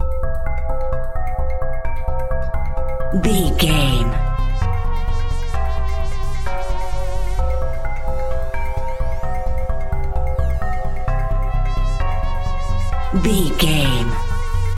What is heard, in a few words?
80s synth sci fi loop